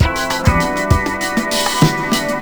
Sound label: loop